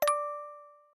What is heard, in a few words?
Music Box, notifications